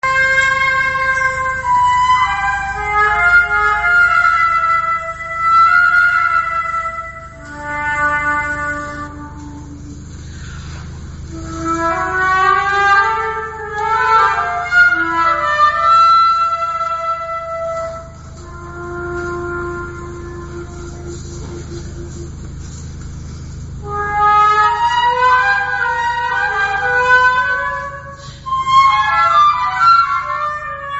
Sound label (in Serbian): Pesma za flautu; Ljubica Maric; KOMPOZITA